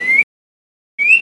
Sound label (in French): orioles; Oriole sp.